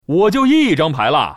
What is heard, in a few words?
endCard